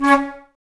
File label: Flute, Woodwind